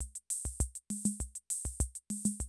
CR78, DrumLoops

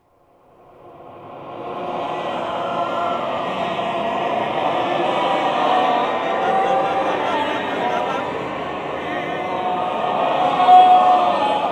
WARMUP REV

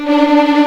D4 TREM, VLNS TREMOLO, String; East West; The Ultimate String Collection

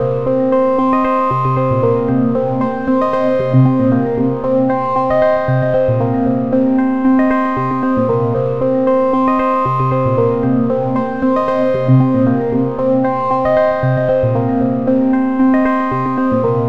Sequence, Drums & Drones

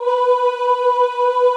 OOH B3, Classical Choir; Best Service; Extended Classical Choir

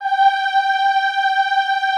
EHH G4, Classical Choir; Best Service; Extended Classical Choir; EHH MIX

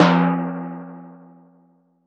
Timpani, Percussion

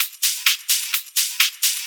Hihat Loop 128 BPM

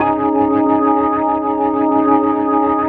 ORGN 123, Keys; Houseworx

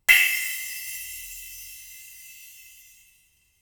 Splash, drums singles; The Roots Drumloops

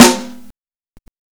Snare Warm, Drum and Bass

